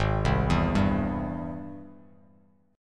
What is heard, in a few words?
G sharp Augmented Major 7th G sharp Bass Standard; G#+M7 arpeggiated; 4,3,2,0; JGuitar